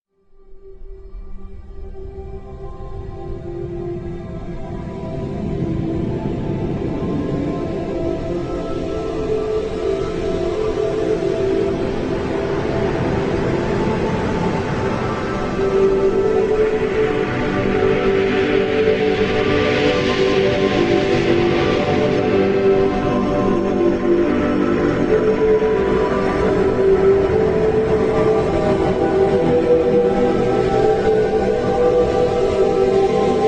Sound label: (Soundscape)